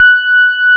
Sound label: DX PIANO; Wizoo - Powered DX